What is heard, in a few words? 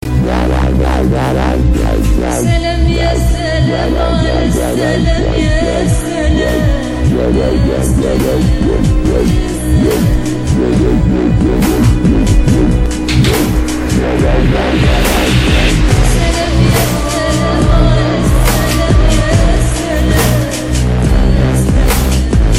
Crazy dubstep